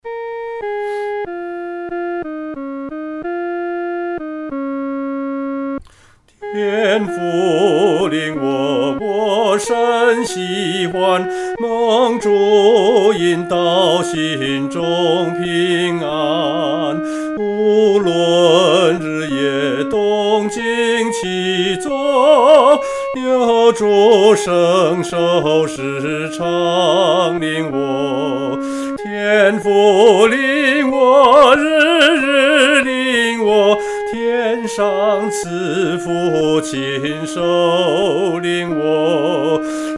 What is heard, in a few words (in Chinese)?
独唱 第一声; 天父领我